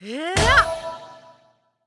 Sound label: Paladin F Cast